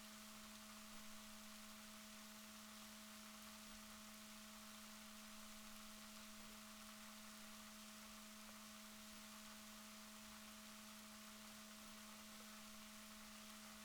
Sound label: rorquals positif, Balaenoptera Physalus Chile